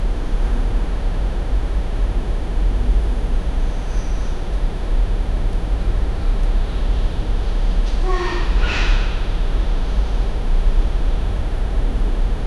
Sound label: distant barn sounds